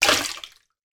household water squeezed from sponge into kitchen sink bowl